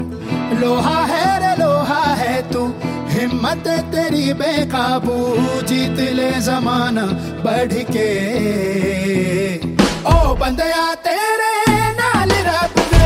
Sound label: ringtone bollywood ringtones